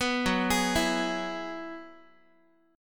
F sharp 7th Suspended 2nd Suspended 4th down Guitar; F#7sus2sus4 arpeggiated